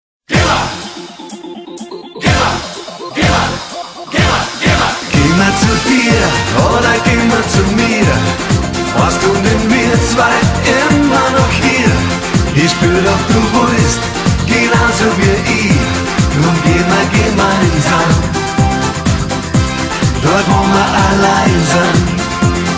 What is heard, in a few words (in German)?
Disco